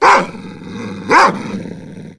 attack, dog

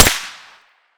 fire suppressed, mac11; weapons

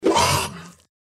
deathclaw strike; npc

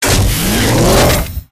silverback get in

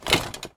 armor

